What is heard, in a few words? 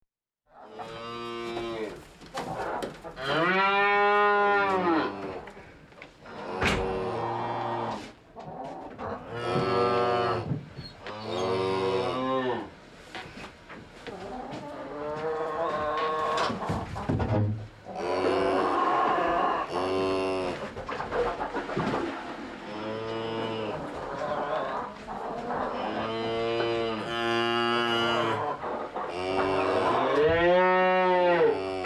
Cow Mooing In A Barn Hens In B_G, Animal, Cattle